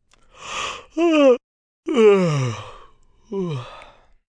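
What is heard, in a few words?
Yawn Classic male, Yawn, male, in three stages; (human voice); Yawning; Voice Box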